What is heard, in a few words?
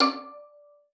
D5, VIOLIN SPICC; Xsample VOL-5